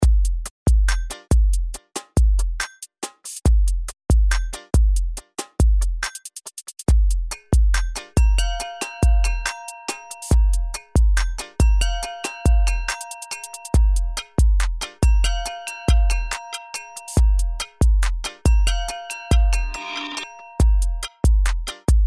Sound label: Punch Hip Hop